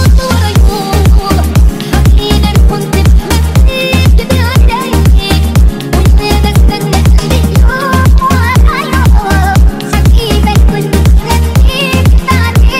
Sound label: car music arabic ringtone; dubai; islamic ringtones